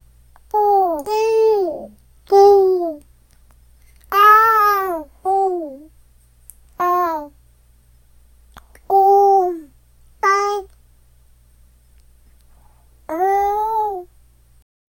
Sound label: a high pitched soft baby; dialogue